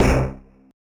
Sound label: marimba steeldrum; ゆめ2っき